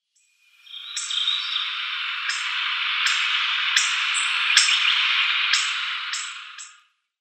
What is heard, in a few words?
Hawfinch call